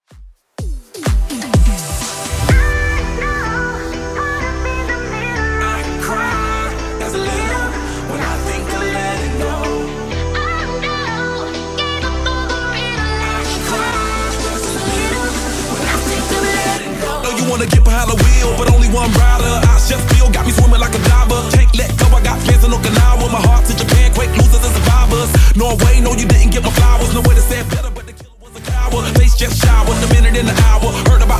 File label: 2ks DJ Friendly Redrum 126bpm